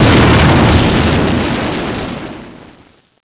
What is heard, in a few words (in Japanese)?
叩きつけ; パワーボム叩きつけ; super otousan